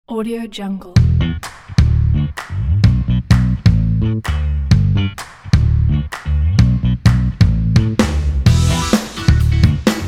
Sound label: funk; owlstockmusic